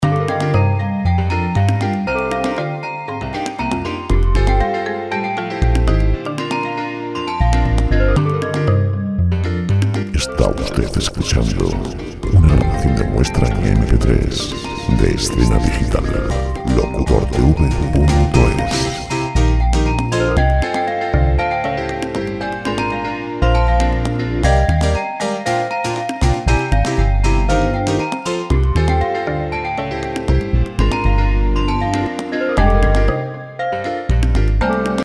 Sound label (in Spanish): campanas 118 G chil musica